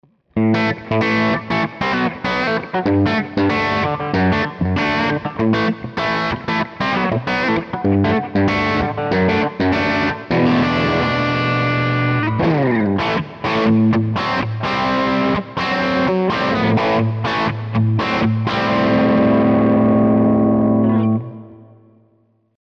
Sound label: Ibanez AZ226 Bridge Humbucker Crunch